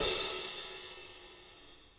crash cymbal single shot D key, Royality free crash cymbal single shot tuned to the D note.